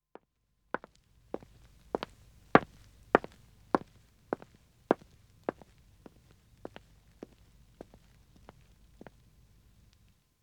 Wood (Dead Acc) Walking man d; Footsteps; BBC Sound Effects Library

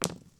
die Throw, Casino; Kenney's Sound Pack

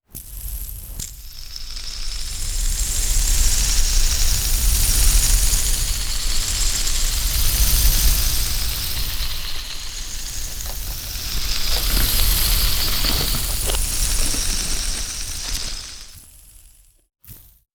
Hand Spinner; Fox Audio Post-Production

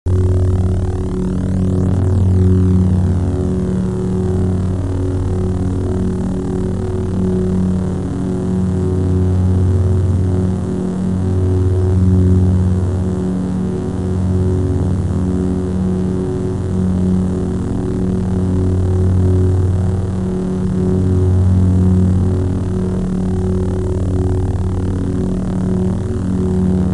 Saber Drone; FXHome

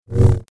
Double Bladed Saber Sound; FXHome

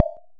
impact Glass heavy; Kenney's Sound Pack; Impact Sounds

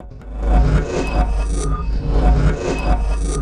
Loop - 140 bpm; 99Sounds; Mangling Audio; Mangled Software; Convolution Abuse

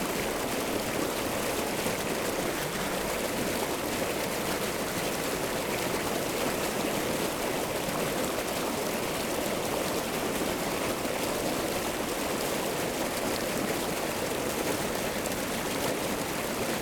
WATER, Dam - Stream falling; Mononeshot

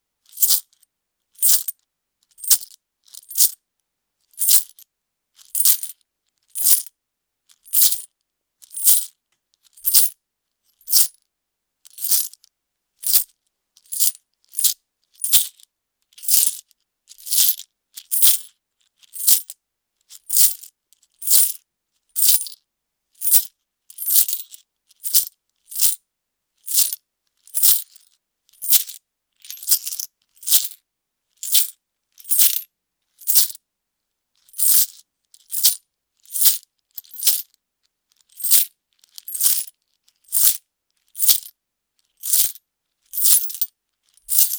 coins throwing from hand to hand; Sack Of Coins; mono; Soundholder

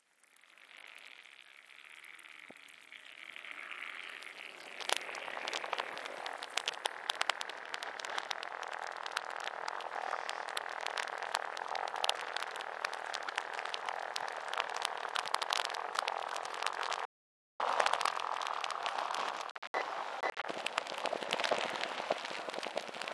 Textures; 99Sounds; The Weird Side Samples

